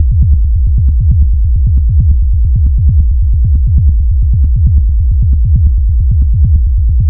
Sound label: Bass Loop E 135, Techno; Ghosthack; AC2024 - Day 1 - Techno Sounds 2025